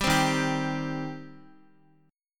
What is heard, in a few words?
Eb 6th Eb, Guitar; strummed; x,x,5,5,3,2